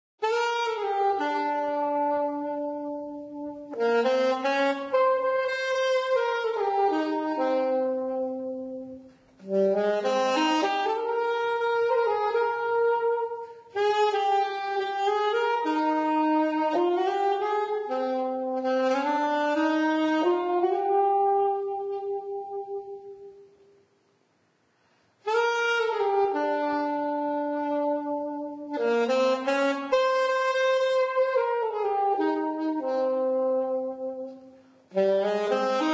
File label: Saxophone; Zomboid; Lifestyle